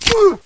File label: pain, player; nzp